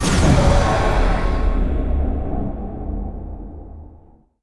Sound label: Menu Start Game